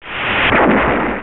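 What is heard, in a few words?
Meteorite; SimEarth Demo; Artificial Life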